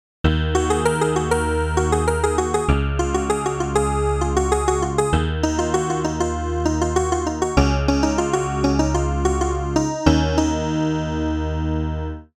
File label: Arturia SQ80 V Toy Pi